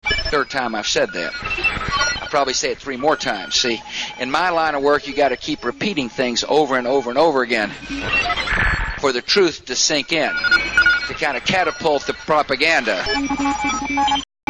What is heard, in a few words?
Shortwave